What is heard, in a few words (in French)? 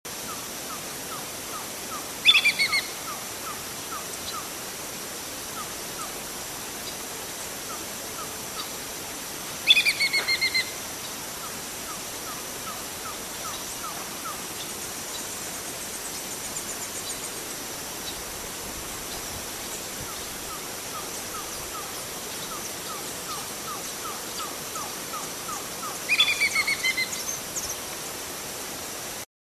râle kiolo